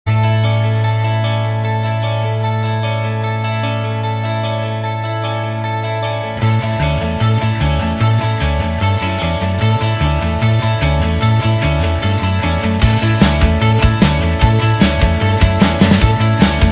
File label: alt rock; Last Ninja 2-the basement loader